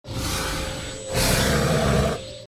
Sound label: Tiger Stun